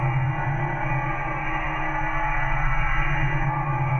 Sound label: Tonal Re Draw, sparse soundscape